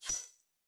game sound effect, steam release

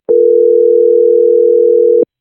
phone ringing waiting; communication

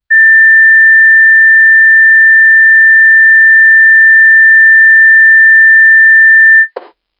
automobile blow off

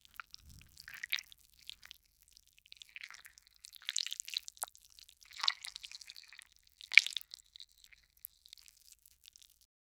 low wet squelch like; sound effect

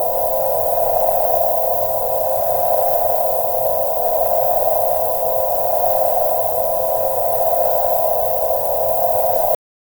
sound of a vinyl needle, slowed

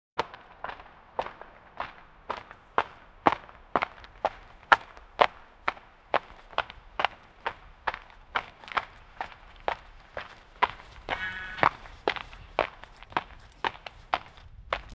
soldiers walking

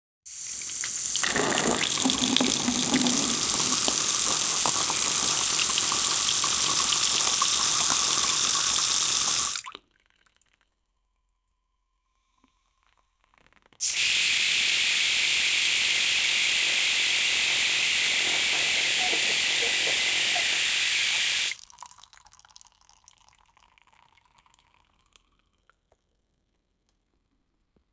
water starting to spray, garden

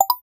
a scifi sound for an

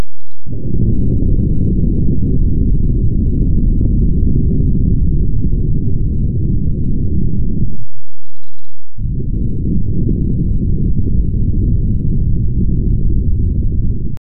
create a sound of a, creature

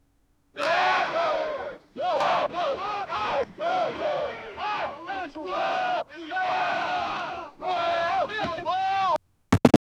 battlefield where men are, horses

